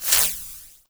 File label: grab strike; endless sky